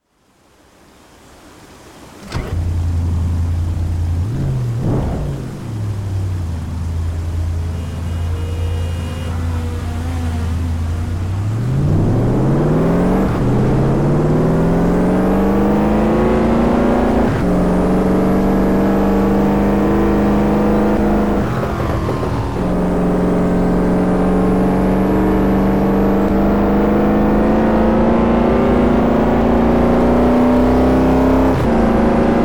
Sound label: audi rs5; TDU 1 - Sound mods by PolloxdaBollox